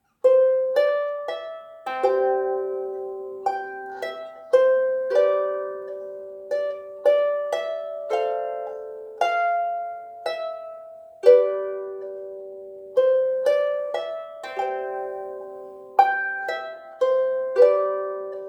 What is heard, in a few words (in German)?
Harfe 15 Saiten; Wonderland